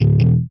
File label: Bass Notes Raspier